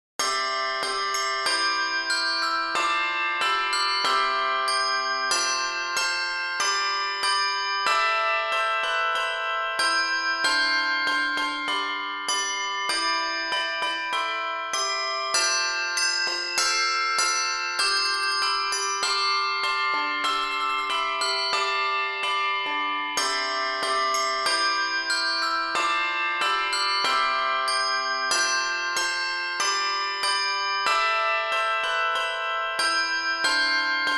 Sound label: Bells INTRADA for Overtones, handbell